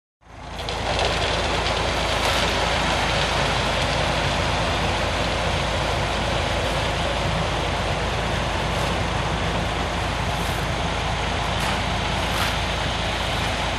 Construction

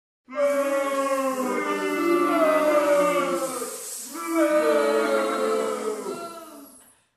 Crowd booing

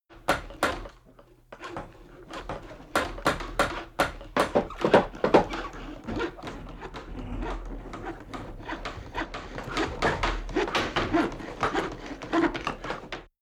Construction Wood Hammer and Saw

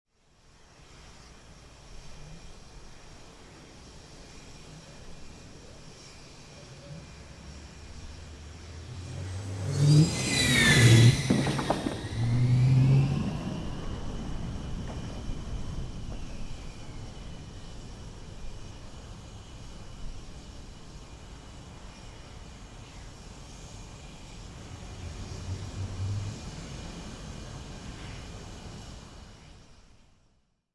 Fighter Jet Hum Take off Sequence

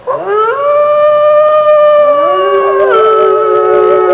Howling wolf pack

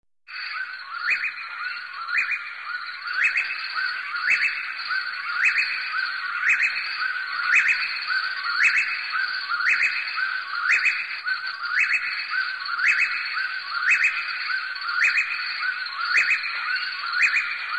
Birds Hooting and Chirping Close

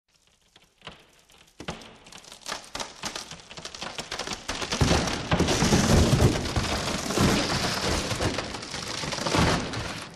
Huge Tree Falling and Cracking

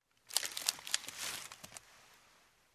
Crackling noise

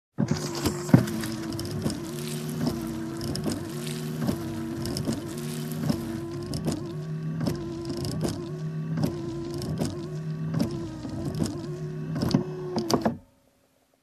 Windshield Wiper

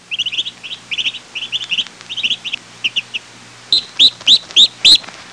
Farm chirping ambience

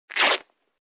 Tearing a Cloth

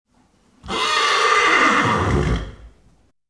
Horse Neigh up-close

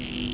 Frog chirp

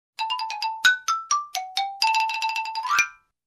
Short funny xylophone melody

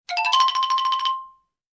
Running xylophone cartoon melody

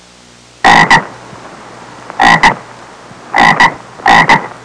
Bull frog croaking lake ambience

